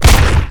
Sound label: glock